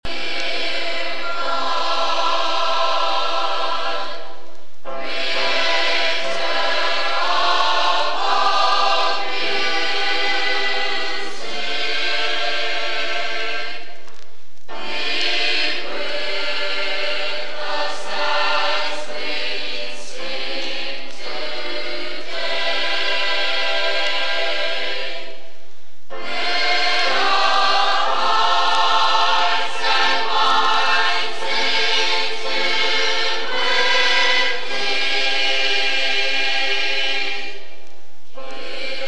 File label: Prayer Senior Chorus; musical memories of 1970